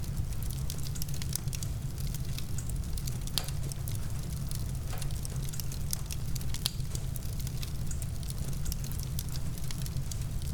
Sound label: Fire